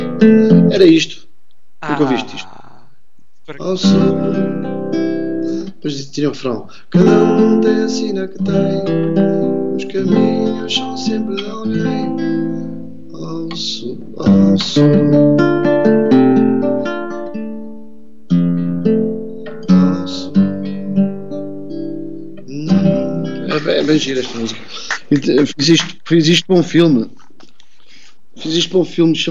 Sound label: jingle